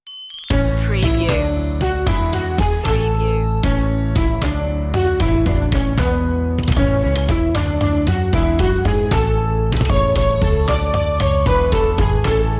humpty dumpty backing

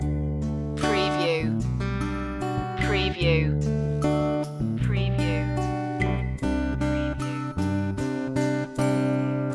beautiful paradise loop

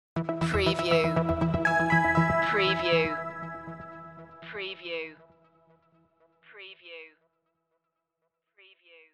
tech logo

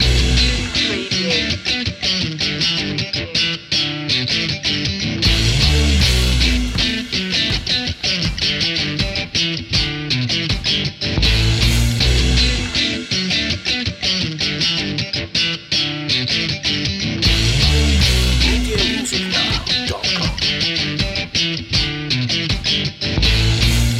rock life loop

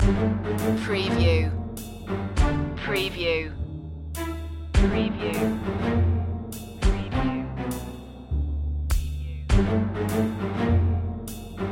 tense shadow loop

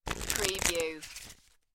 Cards Playing Cards Shuffling

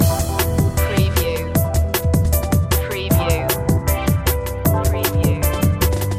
car advert music loop; Soundtrack / Action / Chase

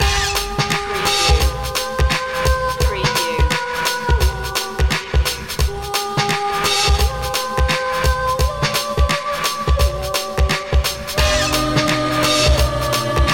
celtic requiem loop

